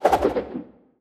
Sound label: death sickle start